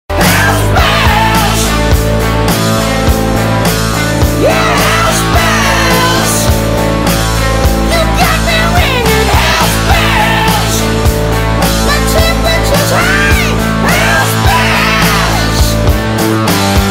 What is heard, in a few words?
Ringtone